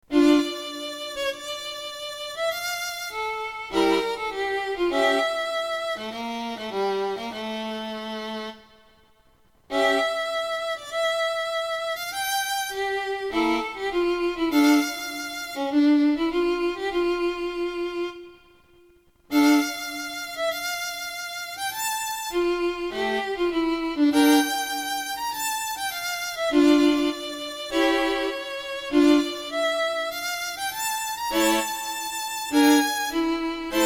Sonata for Solo Violin in the Classic Style

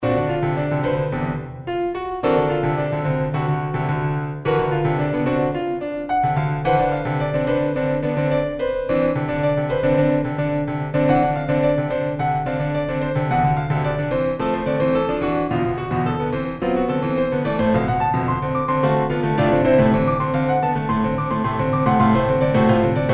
Piano Prelude

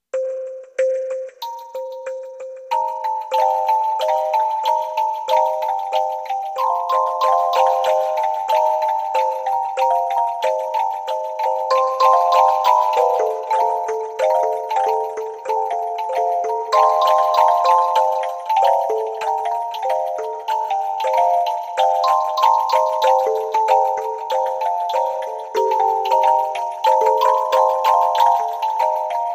improvisation on a virtual kalimba bell

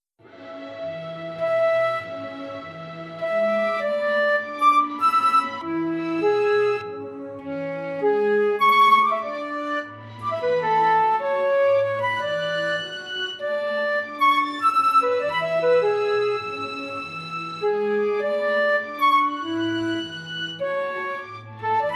for flute viola and contrabass

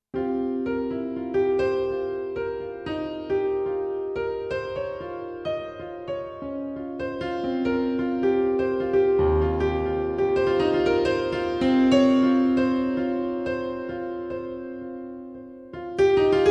Piano Improvisation

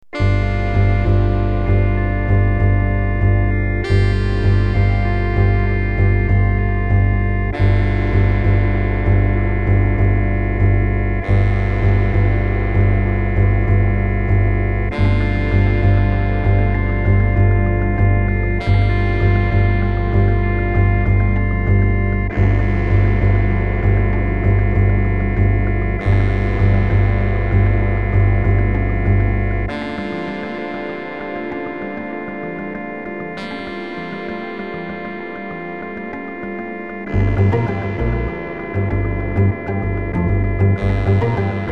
Sax Electronics

